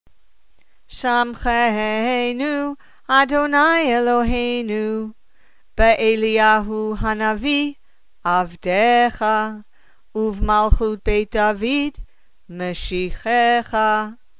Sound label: before Haftarah